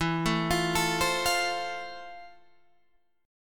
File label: F Major, guitar chord